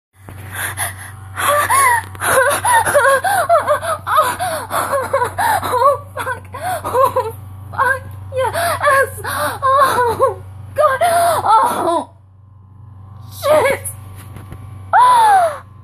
hitachi

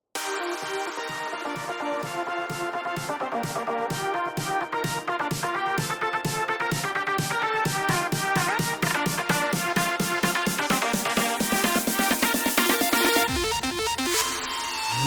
Cool Future Bass Song; Pony Music Archive

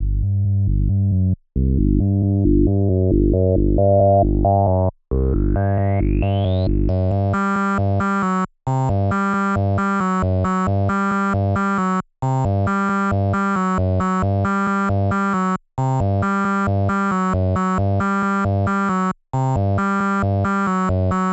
Kraken bass, 135bpm

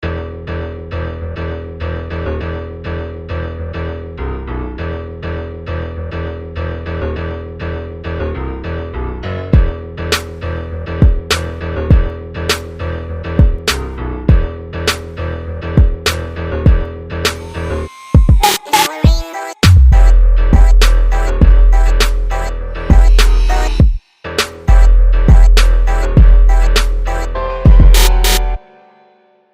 Twerk Remix

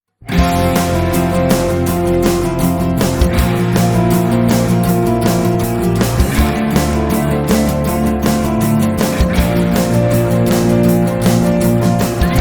Karaoke; Pony Music Archive

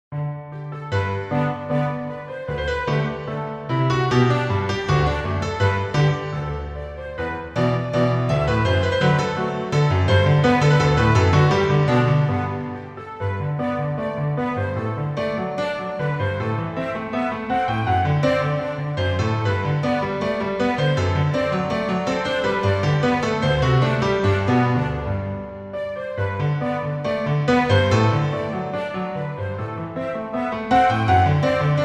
Orchestral Arrangement

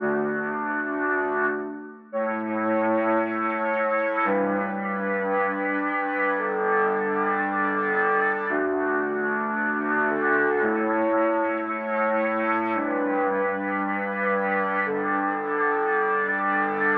chords, 113BPM; Pony Fan Music Remix Stems

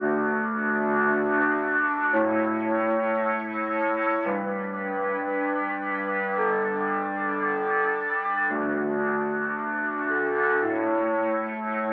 pads, 113BPM

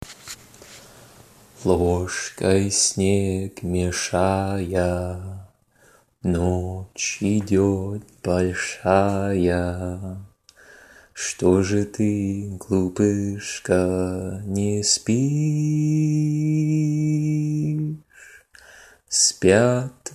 Russian Lullaby

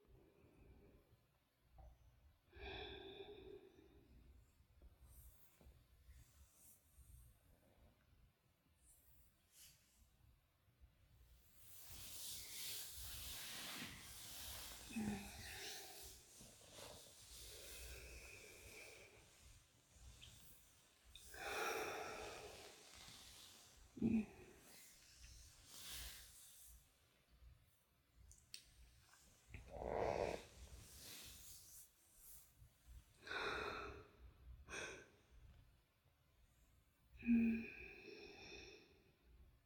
Whimpers; F4A; Tuesday Morning; Moans

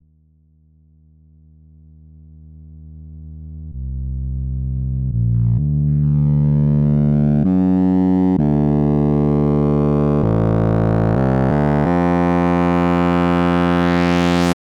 intro bass; 129BPM; Pony Fan Music Remix Stems

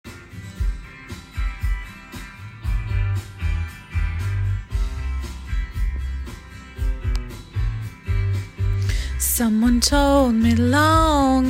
Song Cover Bad Singing

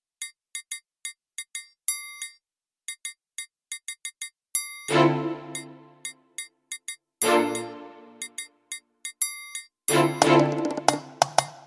groove

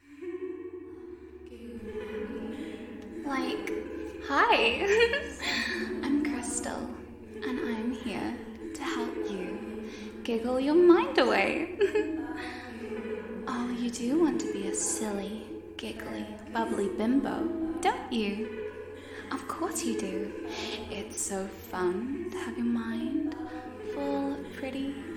The Giggle Loop, Giggle Your Mind Away; [Bimbofication Brainwashing]; [Loop Audio]; [Dumbing Down]; [IQ Play]; [Silly Bimbo]

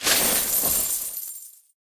Monster; Zenless Zone Zero

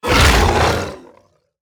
Monster; Zenless Zone Zero

